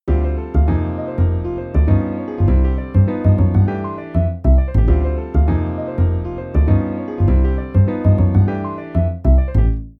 Inversions 1235 ii V7 i

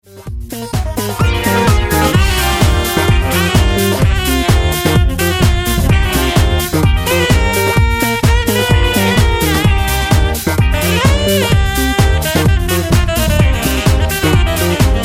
saxsolo

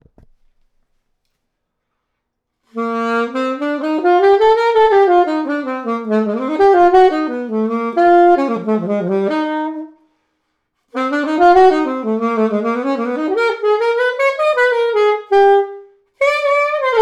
Phil Tone, saxophone

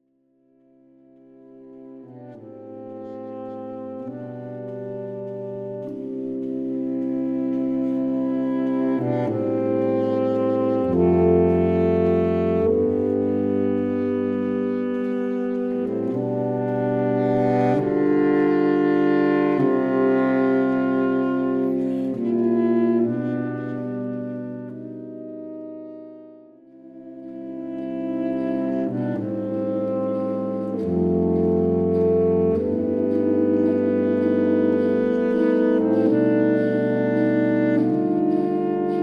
Micro Tones, saxophone; An Exploration of Powerful and Unusual Saxophone Effects

